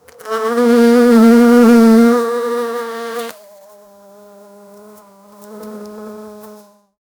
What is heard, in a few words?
single bee flying to a